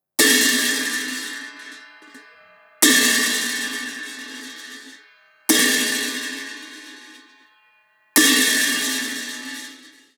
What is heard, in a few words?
Bortal loop BPM 90; Gully Loops; waves of bihu vol 1